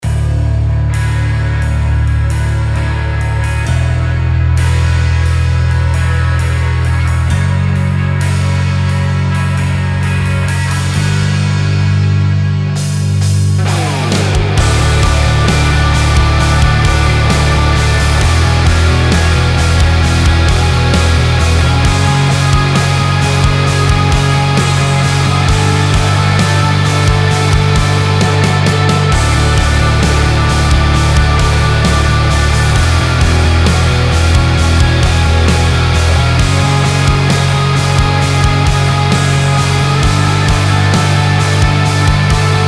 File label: Indie Rock & Shoegaze; Function Loops